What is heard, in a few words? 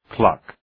clock